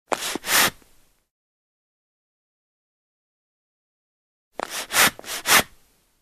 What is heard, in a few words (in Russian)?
malie ruchnie kuznechnie mehi nagnetanie vozduha